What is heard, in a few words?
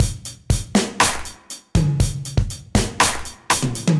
Memphis Studio Kit, Drum Kits Standard; Ableton; Factory Packs; Drum Essentials